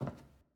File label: Footstep Hallway